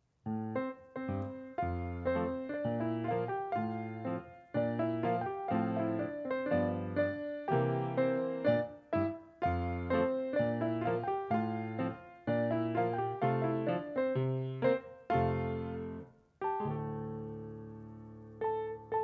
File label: Instrumental; calvaryministries